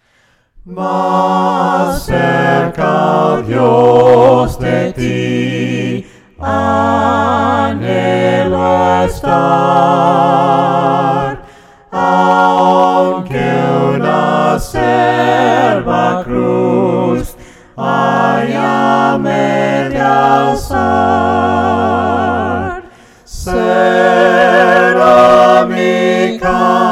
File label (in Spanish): MAS CERCA OH DIOS DE TI VOCES, himnos a capella; cantos del camino